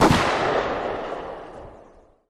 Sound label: mp9 distant; weapons; Counter Strike Model Pack; CS:GO